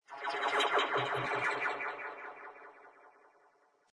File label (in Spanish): laser misterio